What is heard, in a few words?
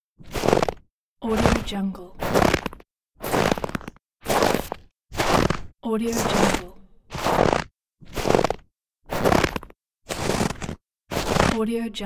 footsteps on snow